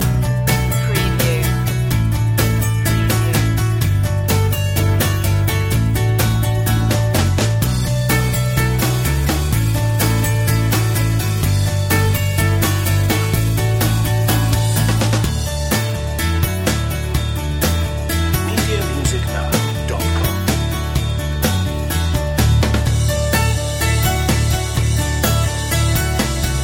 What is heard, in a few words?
home comforts loop